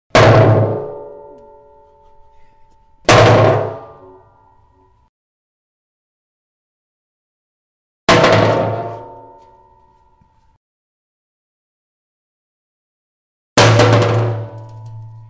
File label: Metal Barrel Drop